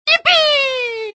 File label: Tripple Jump; Mario; Super Mario 63